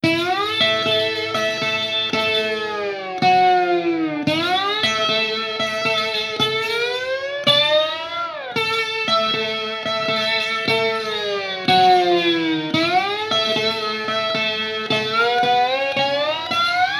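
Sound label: slide guitar; FMOD Example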